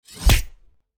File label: Knife Stabbing; soundscrate